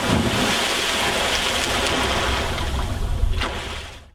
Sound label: Splash